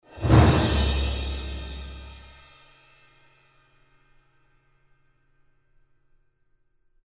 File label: Swell; Warhawk; ps3